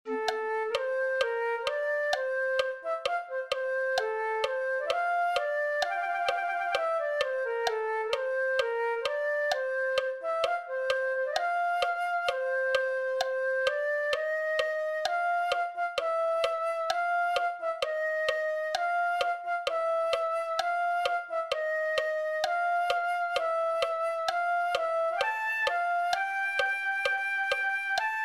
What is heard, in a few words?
flute